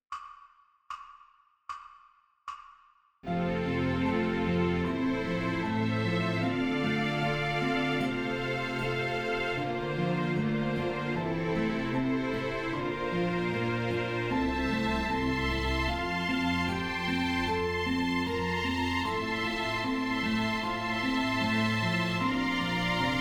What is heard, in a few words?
Skips Horns in Fifths